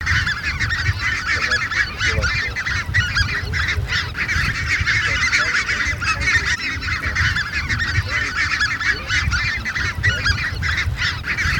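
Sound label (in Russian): Казарка краснозобая Oca Collo Rosso, манок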